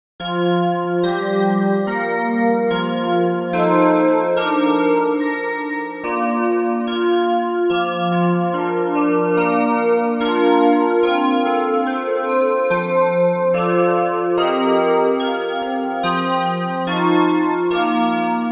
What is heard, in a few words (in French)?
cloches